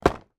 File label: food box of chocolate truffles put down on hard surface